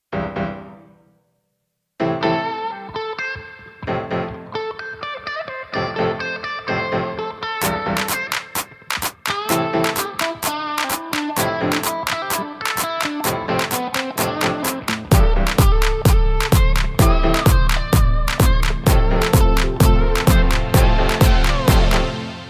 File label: instrumental version